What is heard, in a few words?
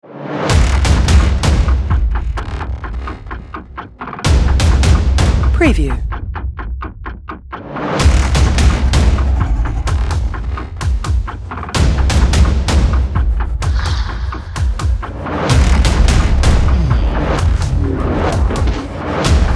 Clock Ticking Tick-Tock Action Sci-Fi Trailer Music